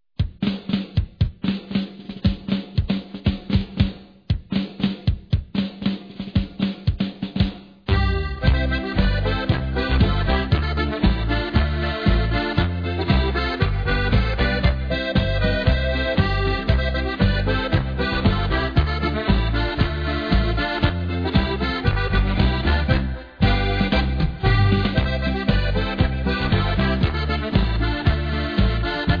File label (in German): Echo der Harmonika